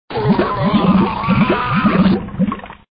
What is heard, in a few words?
death drown; demolition 2